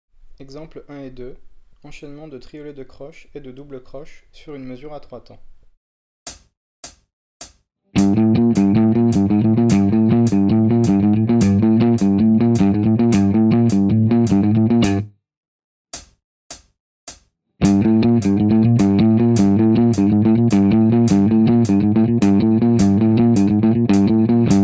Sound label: odd Grouping